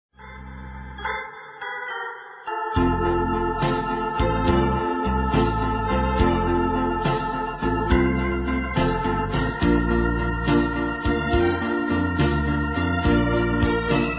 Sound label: organ to brasseur